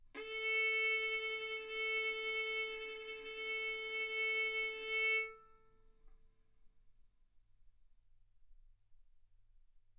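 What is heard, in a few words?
Violin arco mf A string A4 B4 stereo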